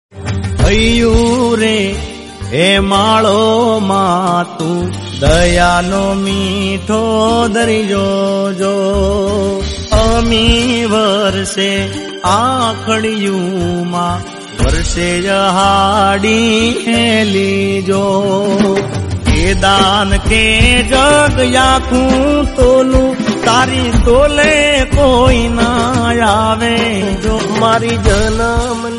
devotional gujarati

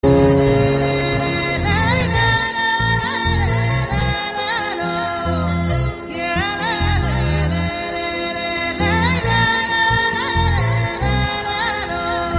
old song

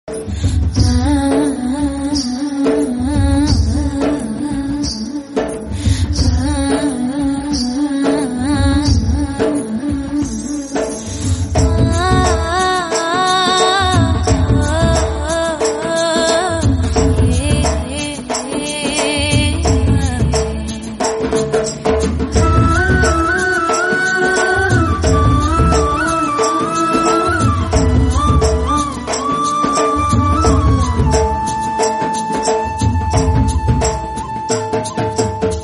song bgm telugu

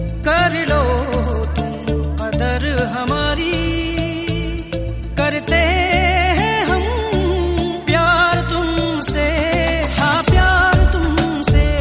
song hindi

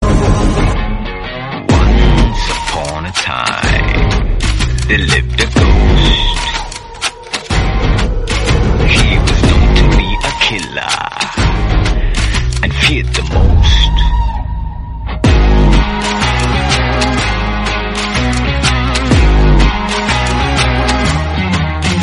bgm, tamil; vikram

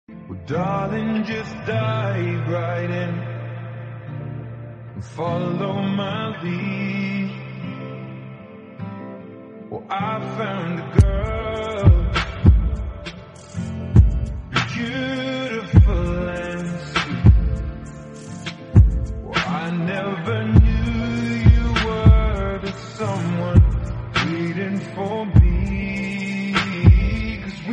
slowed english

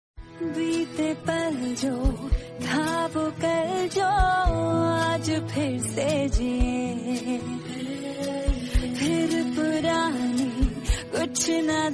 female tone

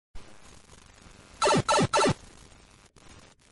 warp pipe sound effect notification; mario

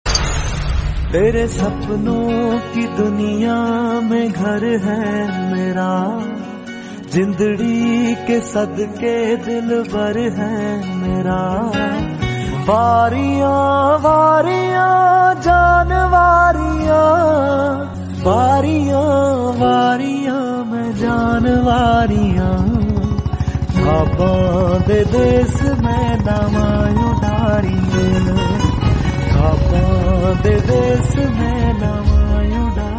sad hindi

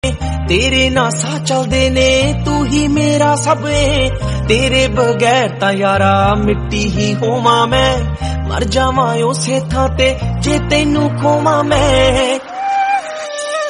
punjabi song

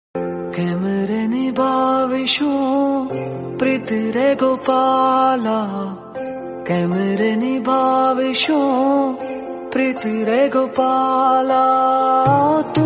Gujarati old song

